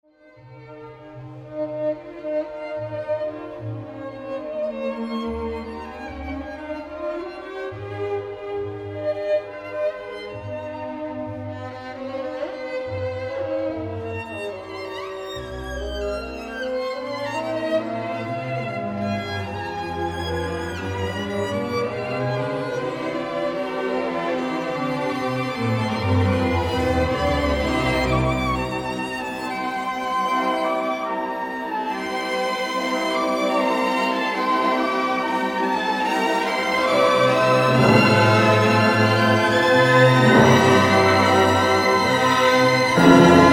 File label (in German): Violinkonzert; Bruch